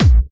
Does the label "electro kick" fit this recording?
Yes